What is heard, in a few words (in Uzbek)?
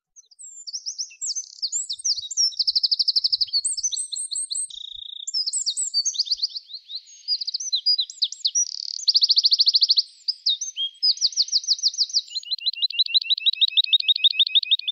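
bulbul yovvoyi dikie solovey, nightingale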